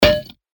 percussion; Mesh; revealed percussion vol 2

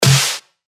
Snare; Smother; revealed claps snares vol 2

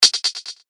FX Hat, cymbals; revealed cymbals vol 1

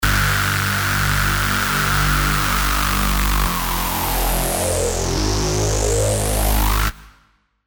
Reese Bazuco, hard techno; revealed sylenth1 hard techno vol 1

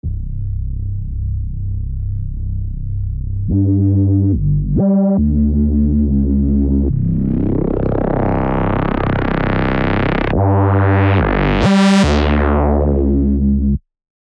Reese; Straitjacket; revealed serum 2 rave asylum

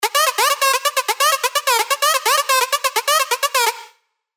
Itchy Scratchy, dirty dutch leads; Spire; revealed spire dirty dutch leads vol 1

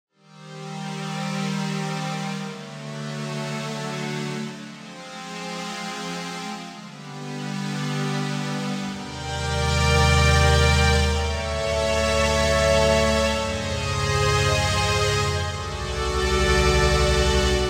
EZ Strings, pads; revealed spire pronoia pads

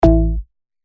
bass shot A; Seductive; revealed bass shots vol 4

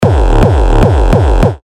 Kick C#; Sinkhole; terra kicks; Revealed Terra Kicks

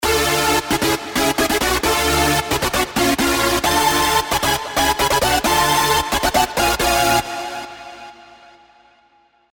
rave stabs; Abomination; revealed spire rave stabs

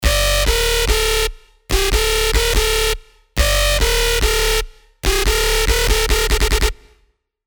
Vending Machine, hard bass; Revealed Serum 2 Hard Bass Reactor

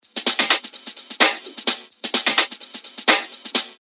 128 BPM; Revealed Top Loops Vol. 4

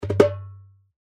G, percussion; revealed percussion vol 1

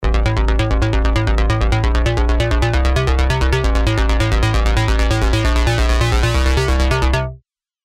Woodless Bamboo; alonso 18 year anniversary pack; Diva

